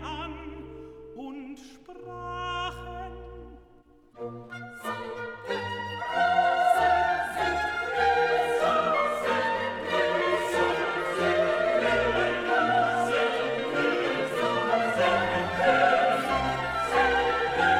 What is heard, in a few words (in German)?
BASS, Stimmen mit Klavier; Johannes Passion